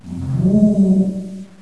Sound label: ghost